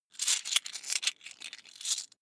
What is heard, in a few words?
coins big clatter